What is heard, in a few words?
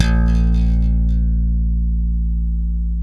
FUNK, Bass; Spectrasonics; Bass Legends